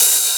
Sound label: Hats Electro Open